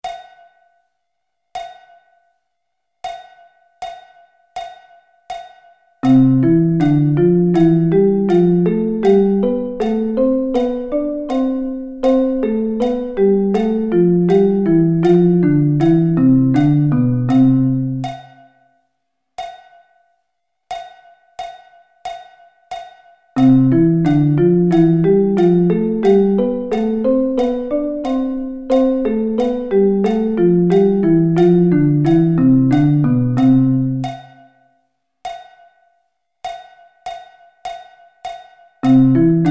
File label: C Thirds